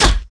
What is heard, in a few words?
hurt; anna